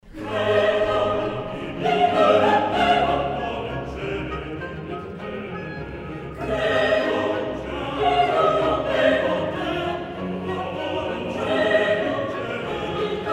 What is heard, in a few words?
Mass in B Minor, BWV 232 Patrem omnipotentem (Chorus)